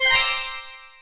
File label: Tricking